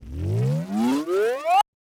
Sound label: Machine Charge Up; SoundsCrate